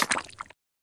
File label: slick